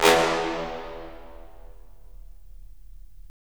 BRS, Roland LA Composer

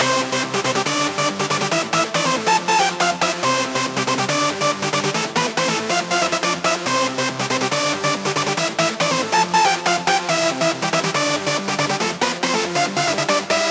140BPM C; Vengeance - Essential Clubsounds Vol.4

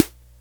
KORG SR120

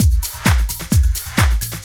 drum 130 groove; Techno Trance Essentials

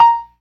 PERC, ORGAN; Keith Emerson Signature Series

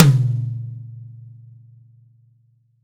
M. Tom, Roland BK-5

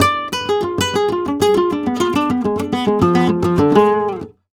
Flamenco Guitar Remates De Pulgar, f

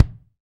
BD, Roland; Sound & Vision - Gigapack I

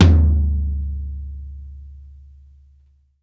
FLOOR; Sampleheads; New York City Drumworks VOL-1

